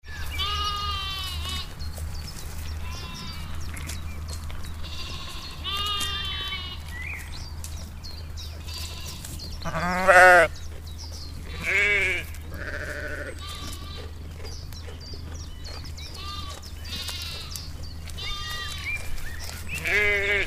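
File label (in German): Schafe und Lämmer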